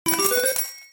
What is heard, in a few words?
win uncommon; slots; gangsterallstar